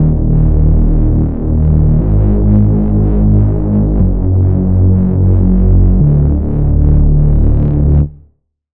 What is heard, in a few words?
Reese; Tactical Training; Routed Sound; Sylenth1 Expansion - Street Vanquisher Vol.1